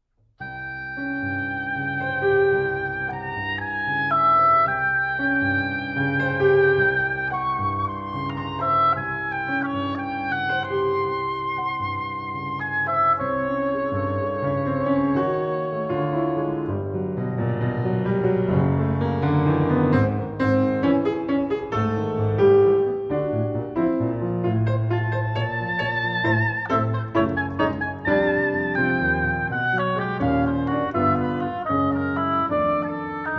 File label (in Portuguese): Sonata Para Oboé e Piano; II. Jornada